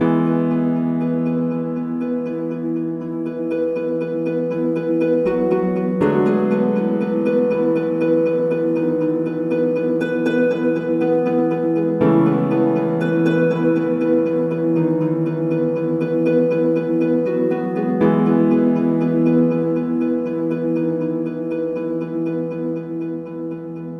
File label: Loading LOOP NO MELODY; dark water slime invader